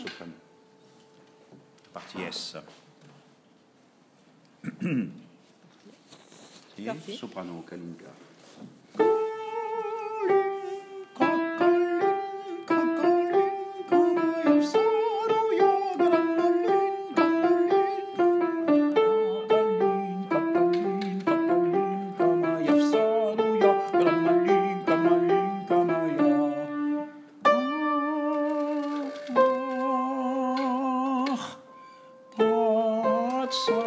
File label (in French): soprano